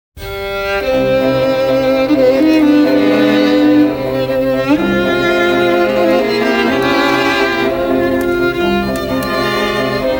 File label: beethoven strings extreme; emastered